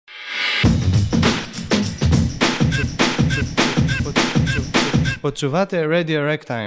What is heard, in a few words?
break, jingle